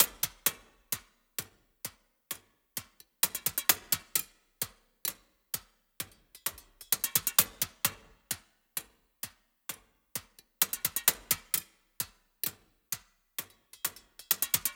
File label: Metals - 130BPM, Percussion; Percussion Kits; STEMS; Ghosthack; SkyRealm; Kit 15 - Foreboding Darkness